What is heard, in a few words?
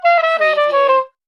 Sax Short Run Down